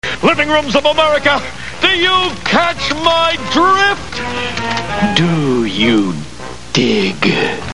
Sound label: dig; TheTick